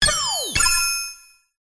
science fiction cyberpunk beep noise; zapsplat

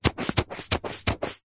household sunscreen bottle squeezes empty; zapsplat

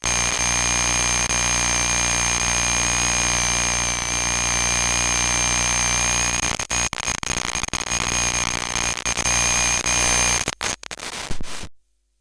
glitched tones AM Radio Interference

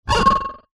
drinking glass rub squeak creak finger; foley

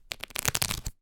leisure playing cards pack flick through

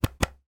office hard drive mini usb cable plug in; sound effects one

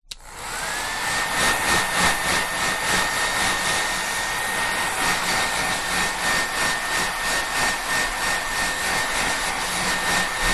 Hairdryer Moving

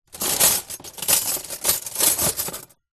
household cutlery in cardboard box movement

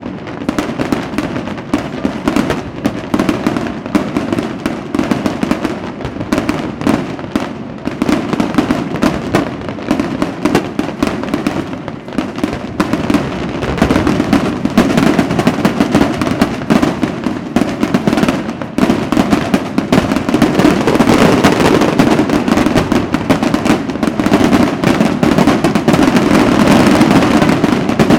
fireworks display intense; sound effects one

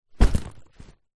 foley bag rucksack set down carpet

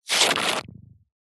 foley liquid foamy frothy squirt fast bubbles

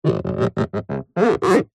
metal rub finger squeak; zapsplat

